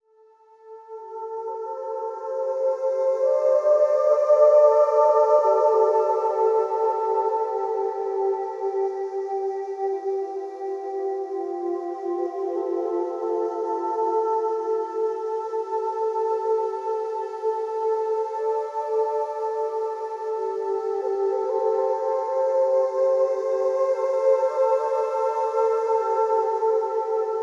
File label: flute down, Loops, Sad